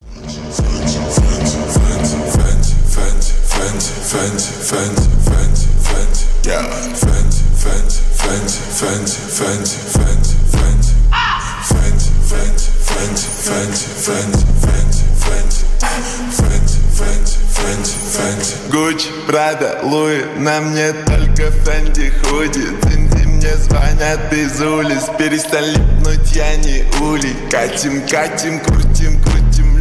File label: slowed reverb